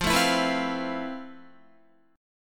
F Major Sharp 11th, Guitar; strummed

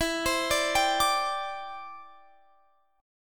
E Minor 7th Sharp 5th Guitar Drop D; chord shape

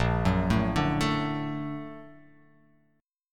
A sharp Augmented Major 7th A sharp; x,1,4,2,2,4,x,x

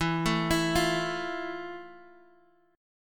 F Major 7th E

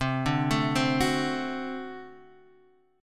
D 7th, C

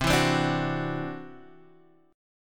E 7th Suspended 2nd Sharp 5th C, chord

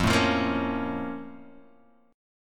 A sharp Minor Major 7th

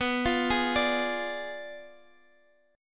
B Diminished 7th B down Guitar; arpeggiated; 4F,4A,5C,5G,5C,6E

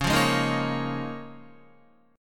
Db 6th Add 9th Db down Guitar; Db6add9 strummed

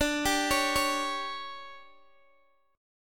D Major 7th Suspended 4th, Guitar, Open D

